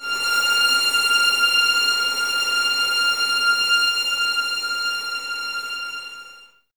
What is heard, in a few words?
VS F 6, String Ensembles, 11 Violins, Sul Ponte; Miroslav Vitous